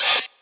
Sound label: Open Hi Hat, Dubstep Drum Shots